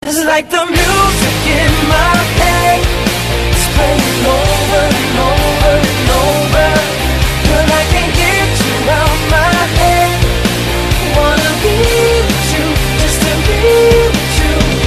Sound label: Rock